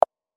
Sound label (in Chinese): 木鱼泡泡音